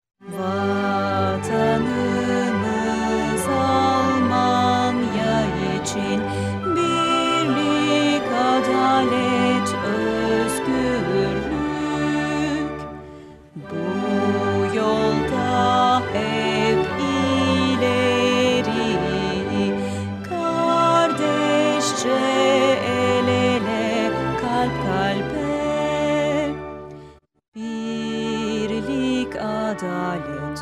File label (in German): hymne